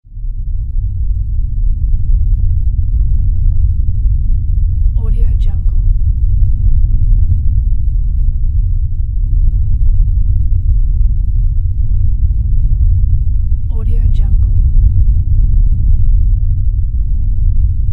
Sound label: rumble